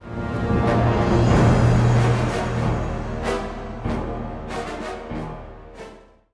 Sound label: Theme Play Stinger